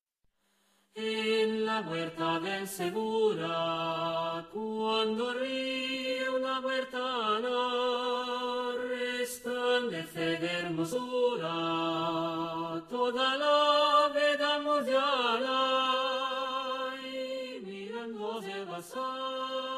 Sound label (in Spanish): 4 voces; canto a murcia